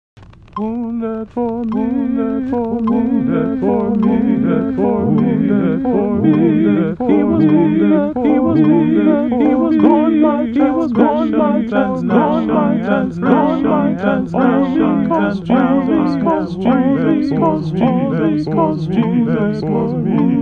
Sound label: Major Canon